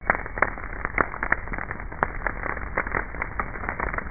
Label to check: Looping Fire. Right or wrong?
Right